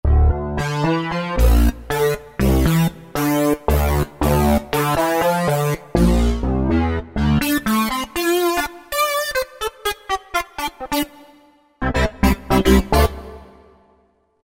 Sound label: NOT Sync!, Roland V-Synth